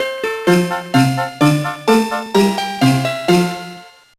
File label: All Candies Collected; The Polar Express; GBA